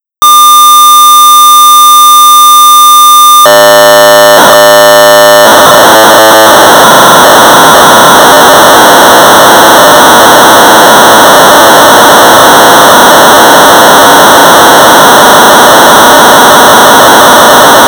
Sorcerer 1200baud; Draw v 1.2 (1982); BASIC

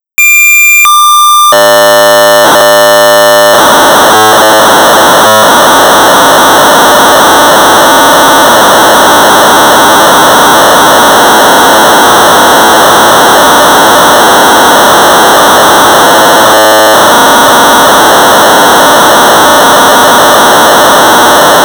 BASIC Sorcerer 1200baud; Flip; J.T.Staley

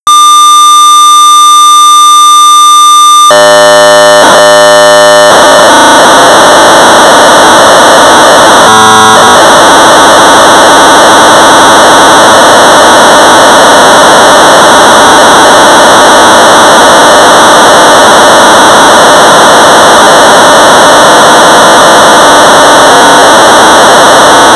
Sorcerer 1200 baud; Submarine Hunt; G.Parkin and N.Townson; BASIC

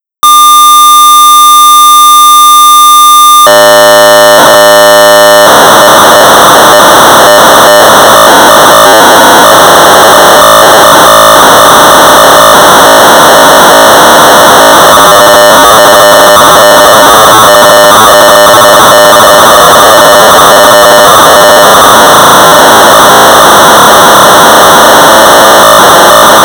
Sorcerer 1200baud; Galaxians

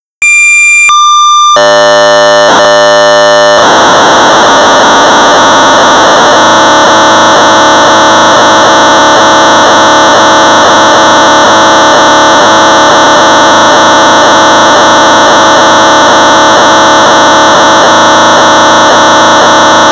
Sorcerer 1200baud; Blackjack; J.T.Staley; BASIC

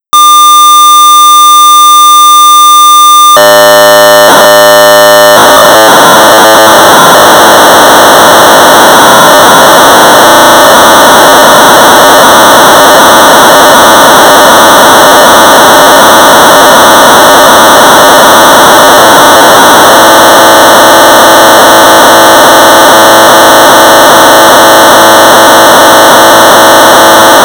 Sorcerer 1200 baud; HiRes Demo v.1.0; 1982; Practical Computing; BASIC

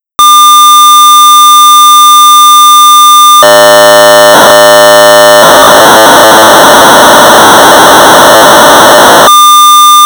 Sorcerer 1200 baud; Kaleidoscope; BASIC

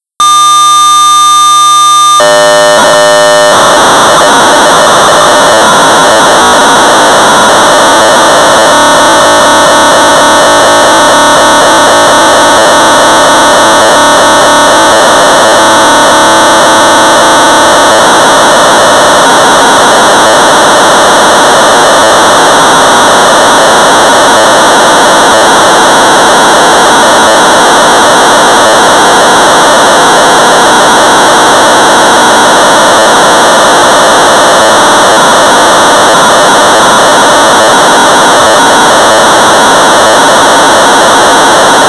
Sorcerer 1200 baud; Snake; modifed by Ian Gillies and called Smunch; BASIC

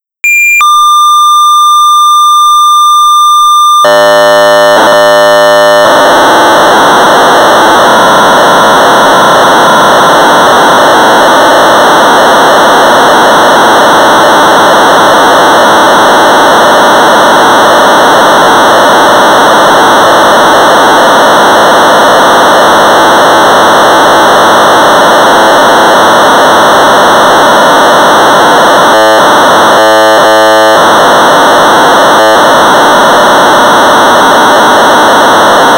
BASIC Sorcerer 1200 baud; Pie Lob; Creative Computing

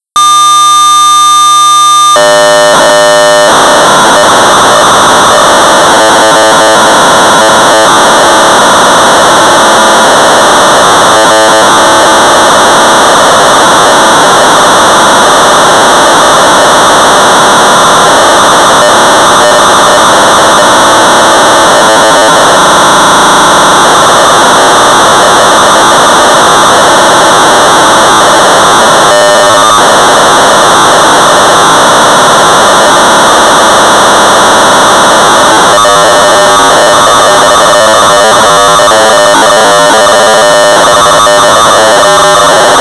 Sorcerer 1200baud; Grotnik wars; Ross Williams

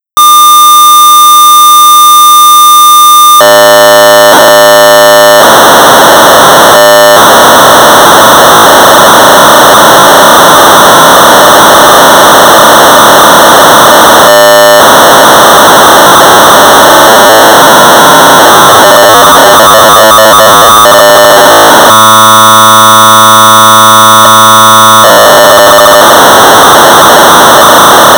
Sorcerer 1200 baud; Nike II; Quality Software; Bob Broffel and Charles Finch

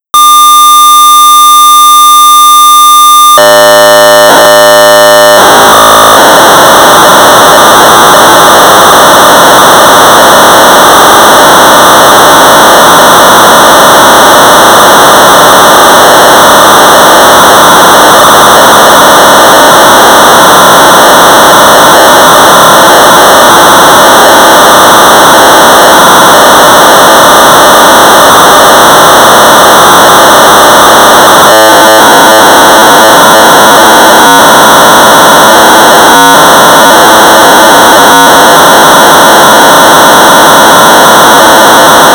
Sorcerer 1200 baud; Midas Microcomputer Database System; R.J.V.Stafford

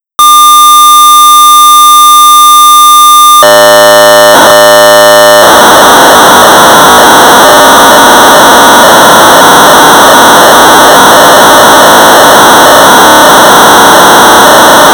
BASIC Sorcerer 1200baud; Tank Trap IV; Don Ursem; Quality Software

